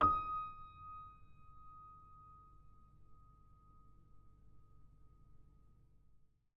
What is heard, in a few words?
D sharp 3